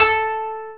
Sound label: KOTO A3, Asian Traditions; USB Soundscan vol.60 - Asian Traditions; AKAI